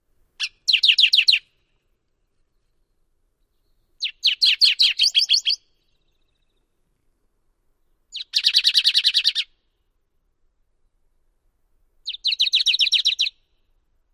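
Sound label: Canyon Towhee songs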